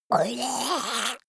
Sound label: monster gurgling high; kreaturen